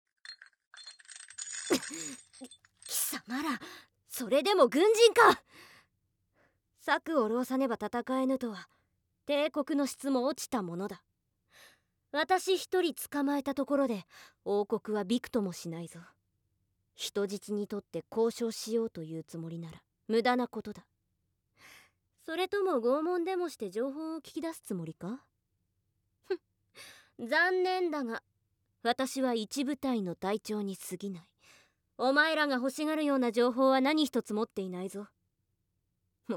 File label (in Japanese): BGM無し効果音入り; 2章◆胸責め～乳房握り、ペンチで抓る～